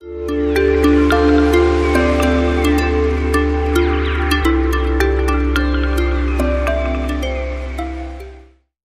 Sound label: Sting